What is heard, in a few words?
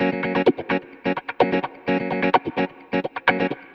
Electric Guitar - F# maj, 128BPM; Electric Guitar Kit; Vengeance Samples; Special Sounds